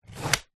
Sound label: leisure toy plastic slider slide